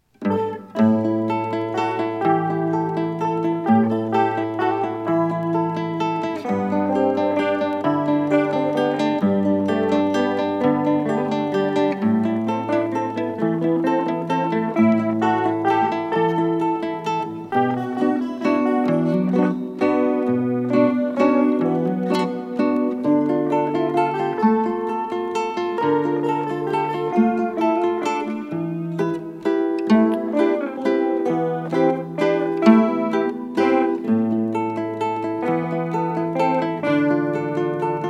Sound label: Duet For Two Guitars